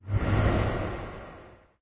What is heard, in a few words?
body swish; smite; thanatos